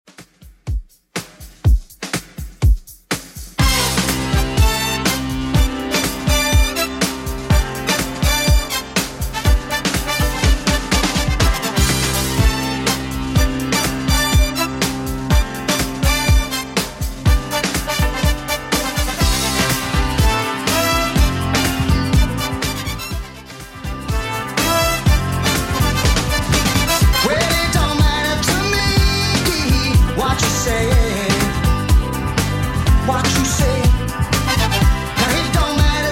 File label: 80s 123bpm; Redrum